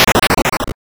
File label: overthrow, attack sound; カタナ１